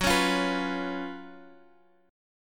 F sharp Major 7th Suspended 4th, Guitar, Half Step Down